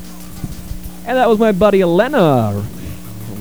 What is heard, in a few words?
old vocals